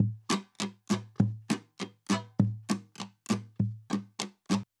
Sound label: guitar percussion 100 bpm; percussion loops; bespoke - Floral v.1